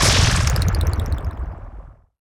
explosion; Heaven Studio Plus; see Saw; explosion Black

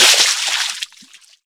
Splash; HeavenStudioPlus; working Dough

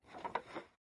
skeleton random; mobs; Mineclonia; mobs mc

